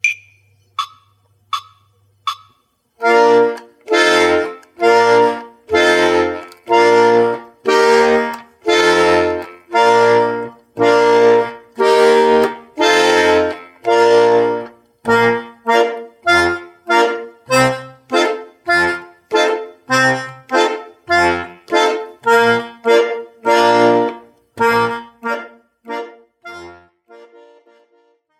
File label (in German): begleiten in b dur